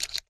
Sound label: Electric Drill